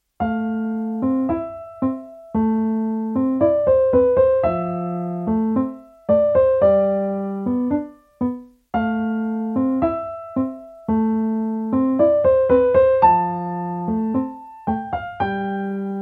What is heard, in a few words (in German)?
Tango-Melancholie, Endtempo